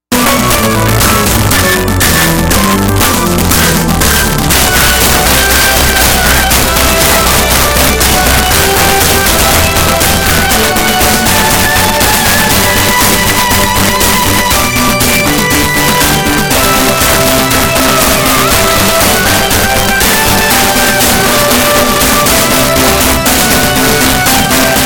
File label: regular pulse; metamorph math